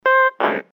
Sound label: die arcade; trogdor soundboard sfx